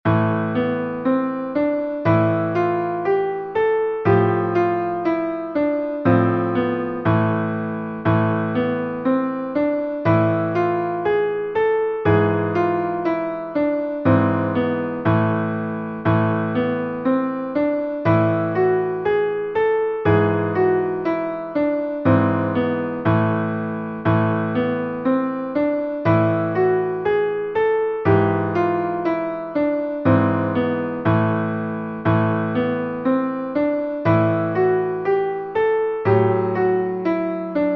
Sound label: Minor Scales The Basic Forms; Minor Scales LP1: The Basic Forms